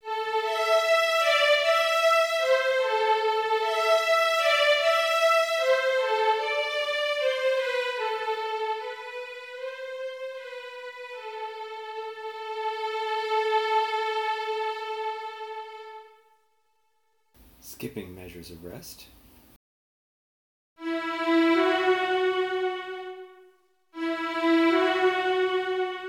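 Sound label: Old Friends Bartok Violin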